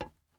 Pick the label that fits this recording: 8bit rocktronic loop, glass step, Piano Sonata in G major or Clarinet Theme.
glass step